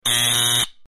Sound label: Solenoid Buzzy Rapi, buzz